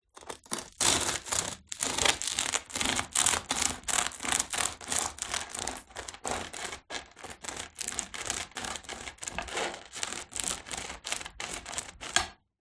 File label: crusty bread cut with knife